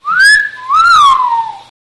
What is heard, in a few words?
whistling sounds